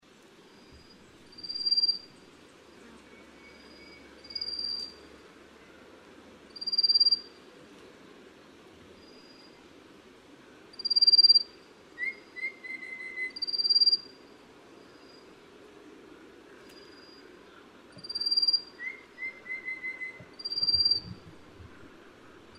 棕面鶯; 棕臉鶲鶯; 樹鶯科/留鳥

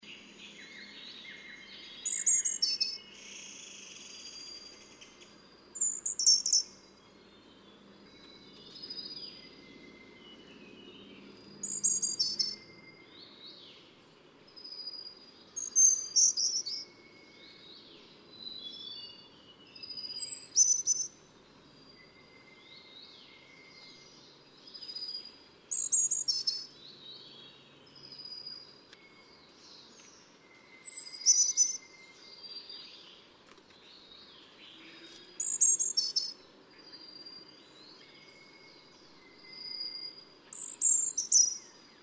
黃胸青鶲; 黃胸姬鶲; 鶲科/留鳥

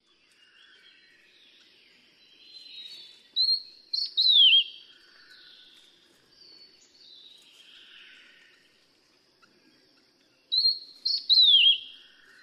黃胸藪眉; 藪鳥、黃痣藪眉; 噪眉科/留鳥

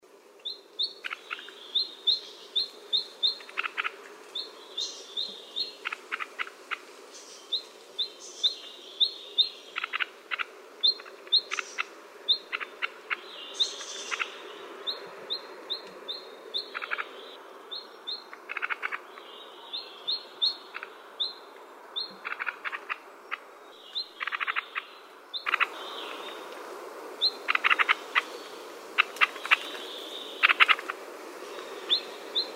白眉林鴝; 鶲科/留鳥

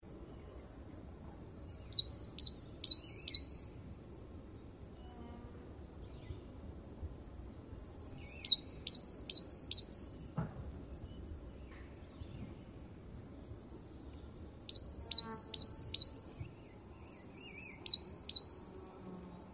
煤山雀; 山雀科/留鳥

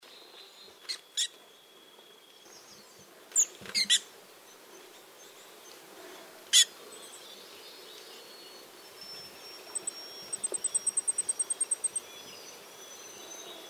火冠戴菊鳥; 台灣戴菊; 戴菊科/留鳥

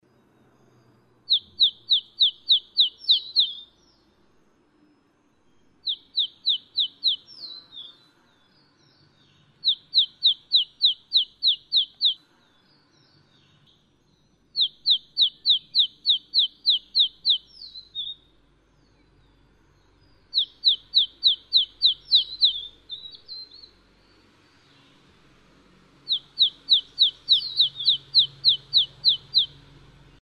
青背山雀; 綠背山雀; 山雀科/留鳥

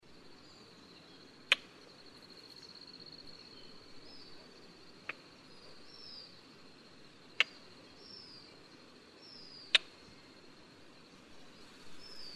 灰腳秧雞; 灰腳斑秧雞、白喉斑秧雞; 秧雞科/留鳥

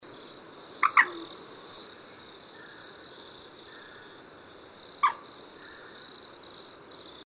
紅冠水雞; 黑水雞; 秧雞科/留鳥

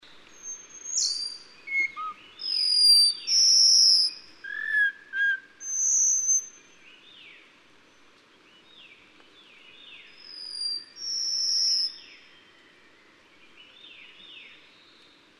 台灣紫嘯鶇; 鶲科/留鳥

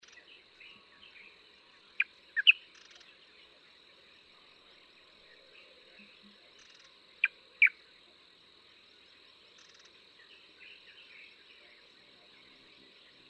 白頭翁; 白頭鵯; 鵯科/留鳥

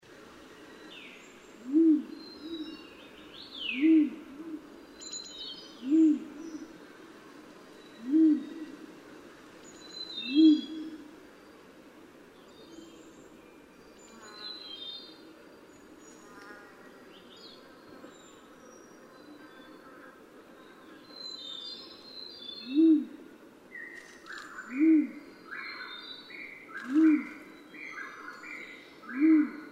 灰林鴿; 鳩鴿科/留鳥

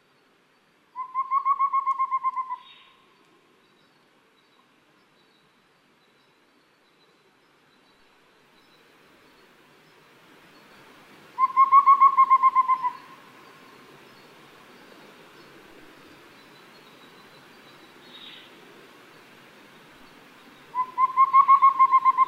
鵂鶹; 領鵂鶹; 鴟鴞科/留鳥

